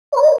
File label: crossbills toop